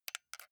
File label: console controller xbox rb press slow soft